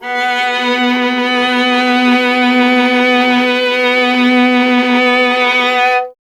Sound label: viola B; Miroslav Vitous; Solo Instruments VOL-1